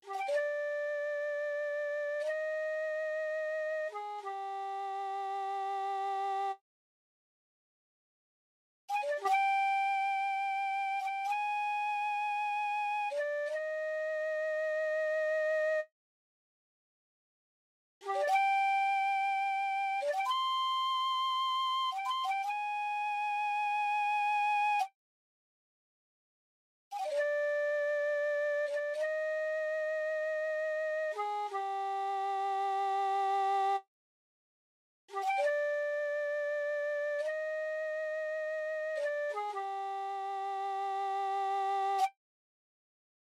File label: MIDI BGM